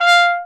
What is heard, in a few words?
Trumpet staccato F4; Orchestral; DirectWave; Varazdin Orchestral